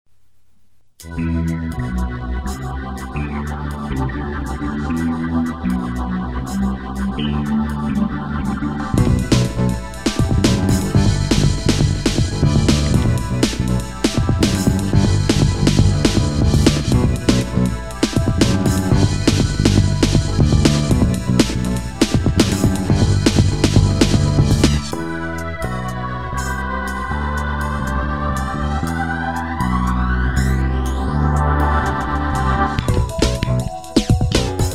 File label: Delay